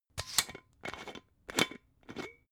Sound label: glass bottle massive open slow